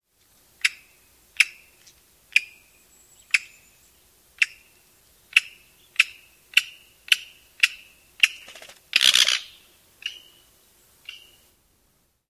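Black-backed Woodpecker pik calls and flight churr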